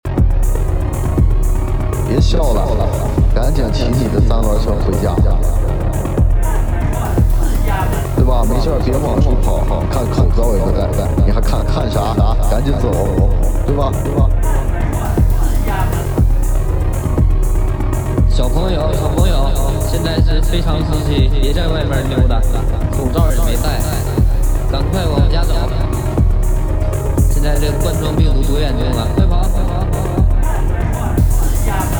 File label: dron loop